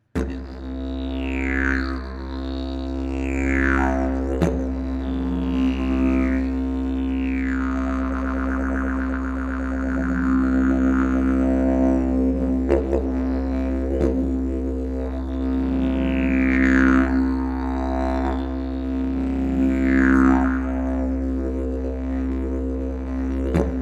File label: didge